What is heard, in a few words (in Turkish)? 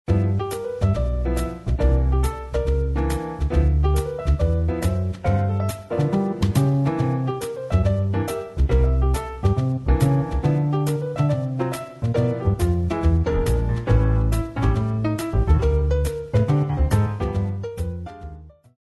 santral fon muzikleri